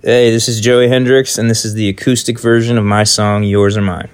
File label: Intro Acoustic